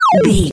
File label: retro magic spell cast